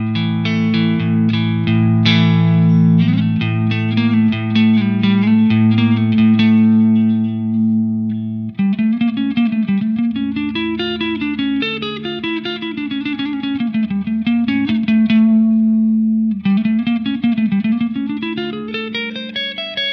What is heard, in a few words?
D'Angelico Bedford Deluxe Clean Neck